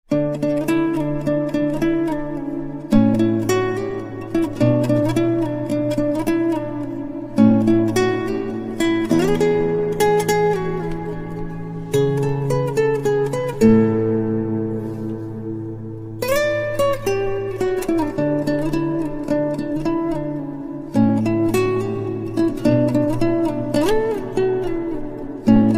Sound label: Guitar Slow